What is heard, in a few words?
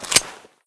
grenade pin pull